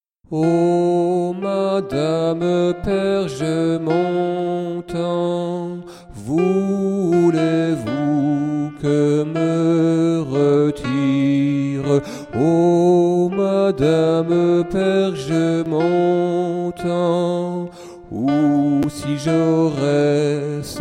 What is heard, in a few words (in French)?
Alt madame-alto